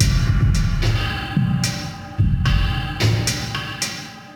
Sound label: Muddy Drums Kit 110 bpm; MIDI Clips; Ableton; Factory Packs; Mood Reel